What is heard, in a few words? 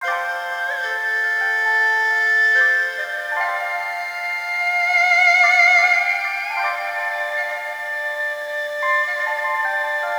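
background music asian; yakuza ryu ga gotoku kiwami 2 pc gamerip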